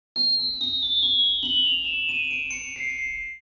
Old vintage cartoon dream

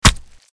Battle axe body hit

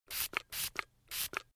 Perfume pump sprayer

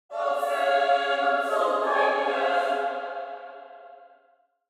Angelic choir angels; ascend to greatness

